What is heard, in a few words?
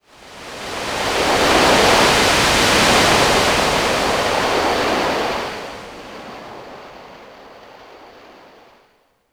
Yamaha TG100 Seashore